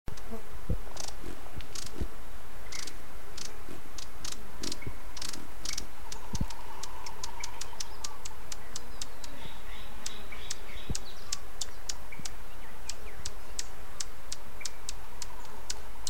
xantuss hummingbird

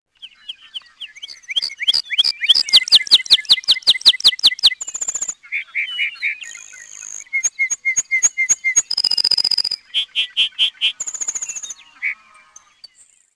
lark bunting

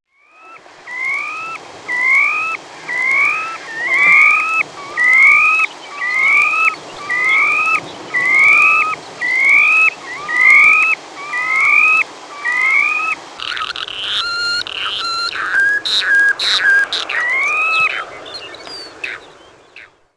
stilt sandpiper

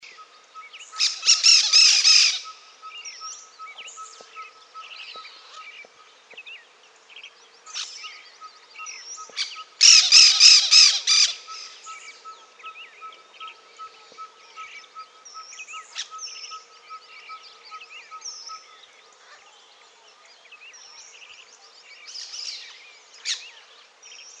cactus parakeet

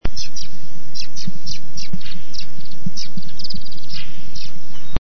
white banded swallow

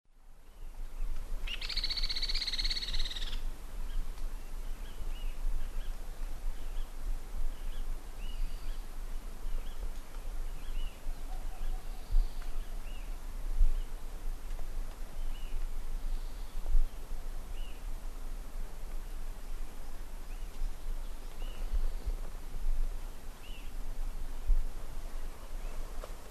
striped woodpecker

